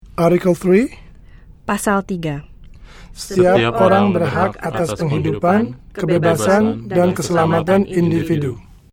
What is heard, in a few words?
Indonesian chorus